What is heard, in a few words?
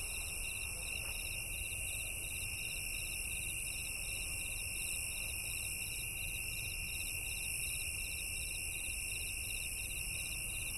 summer field